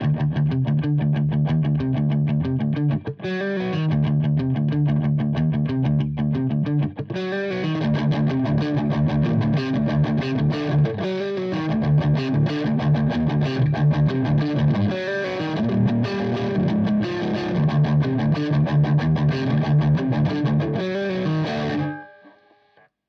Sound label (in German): Verzerrer Pedale Gain Channel Sweet Spot; HB Vintage